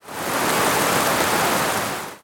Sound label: ambient battle; World Of Warships